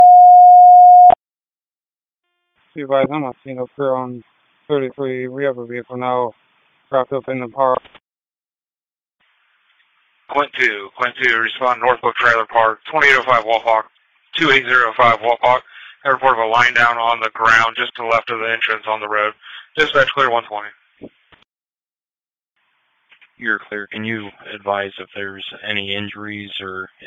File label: Fire & EMS, Tone Alerts; Saint-Marys; Shelby - Sidney Fire & EMS